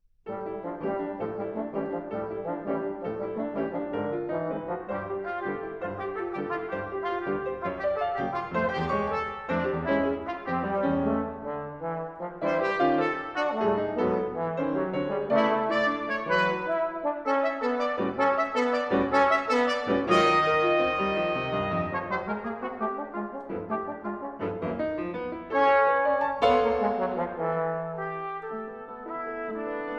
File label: Divertimento For Trumpet, Trombone, & Piano Presto